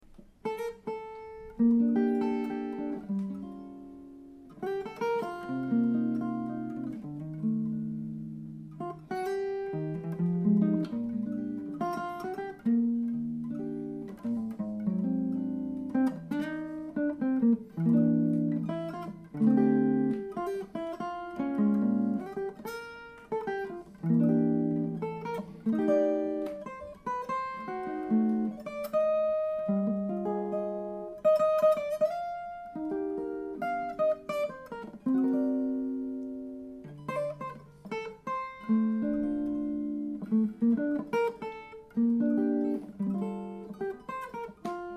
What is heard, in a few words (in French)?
PM100 en acoustique